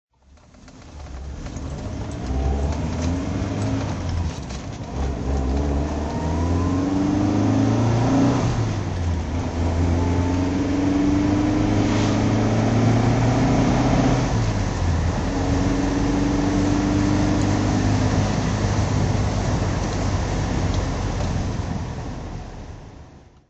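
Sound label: alfa romeo gtj 1600 drive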